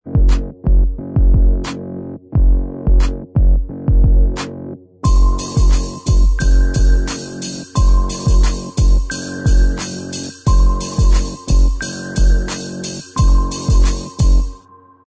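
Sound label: instrumental, backing track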